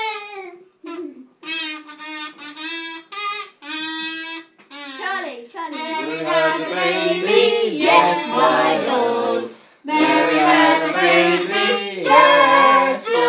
kazoo christmas tunes

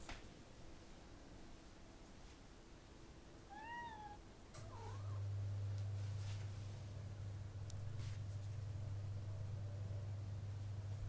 cats singing for their supper

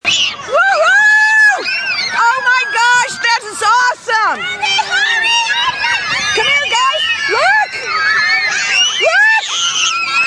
space shuttle

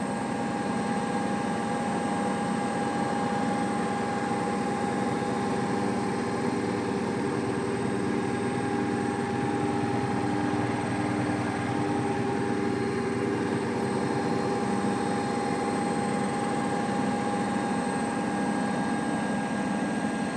washing machine

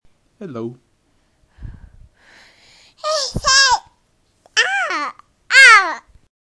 baby

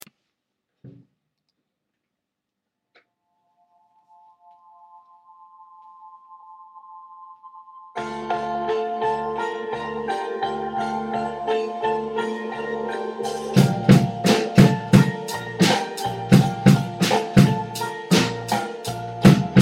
drum solo

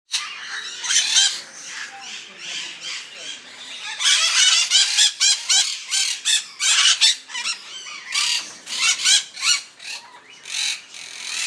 white eyed parakeet

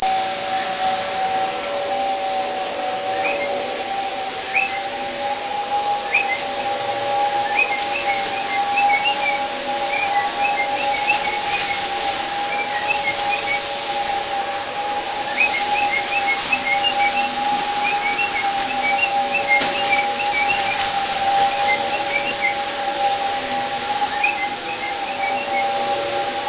a cockatiel who loves the vacuum cleaner

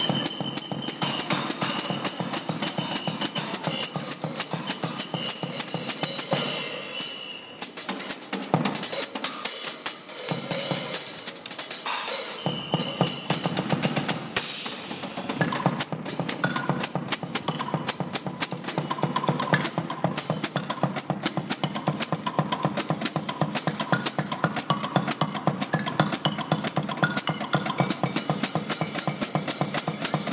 wild street drummer in glasgow

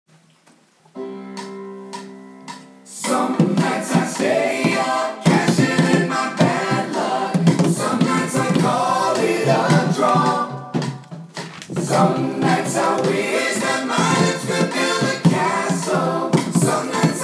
rhythm

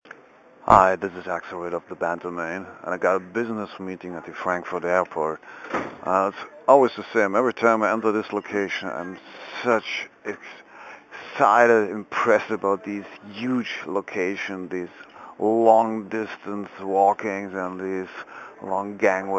frankfurt airport

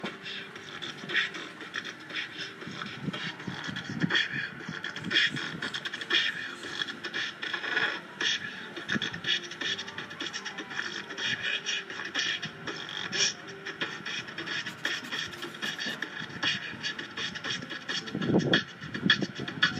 beatbox

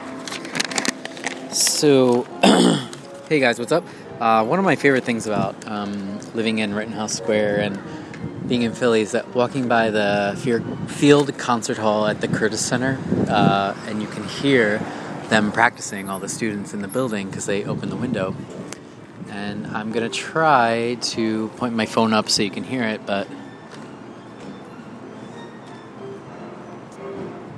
classical concert from a window walking home

